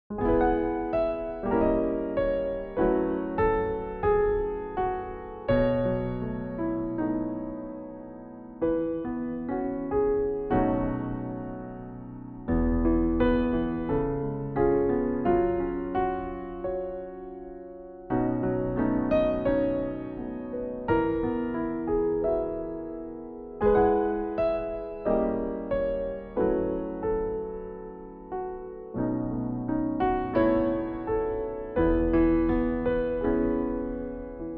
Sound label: (Piano Backing Track